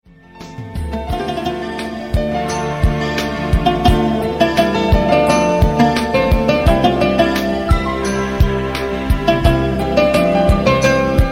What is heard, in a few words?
Slow Love Tone